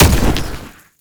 Hit; Warframe; Uriel; Infernalis

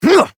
Jump Adult Operator; Warframe; New War

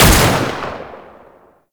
Pistol Shot; Weapons; Warframe; Tenno; Temple Signature

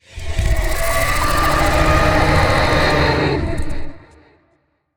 Vocal Idle; Warframe; Zariman; Void Angel

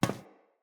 Friendly NPC Step Default; Characters; Footsteps

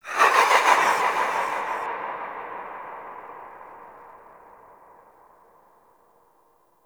Movement Accent, ambience; HarrowQuest; Operator Twin; Warframe

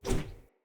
Short Sword Melee Whoosh Fill; Enemy; Weapons; Short Sword Elite

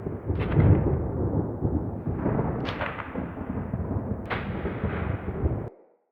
Cannon Storm Loop; Weapons; Player

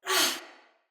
Pain, Female; Sinecure Soldier Female B

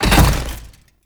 Collision Fast, Motorcycle

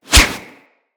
Brawl Whoosh; Characters; Brawling

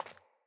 Sandals Run Default, Footsteps; Player

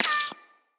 Bullet Impact Ricochet

